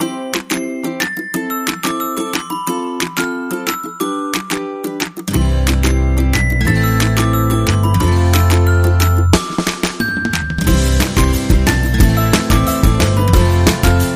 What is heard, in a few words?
Happy cooking show; Bootflare